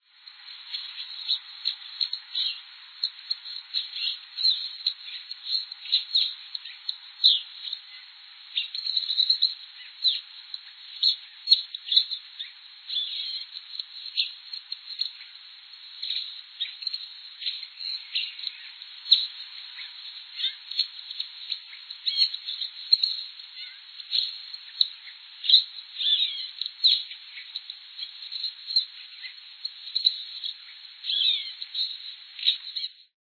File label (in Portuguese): gaturamo verdadeiro